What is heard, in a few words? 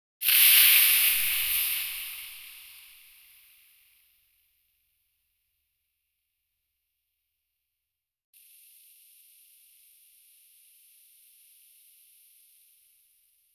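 the sound of trees snapping, creature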